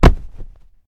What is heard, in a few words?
bodyfall